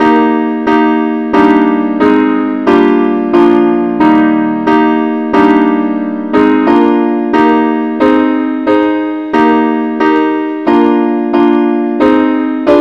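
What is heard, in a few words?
advance accompaniment, key ees; christe Sanctorum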